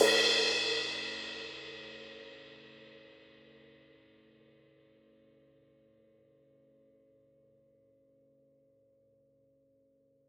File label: Crash China Edge, Cymbal; One Shots; Drums; Ableton Live 12 Lite; Core Library